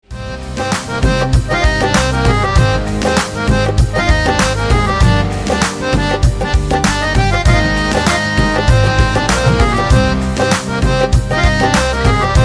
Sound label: Instrumental Ringtone